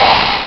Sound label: shield hit